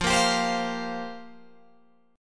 F Suspended 2nd Suspended 4th F down Guitar Open G